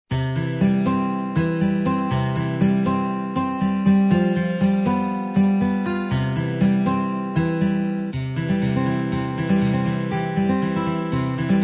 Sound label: guitar